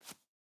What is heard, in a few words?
footstep hide concrete jump start; Rust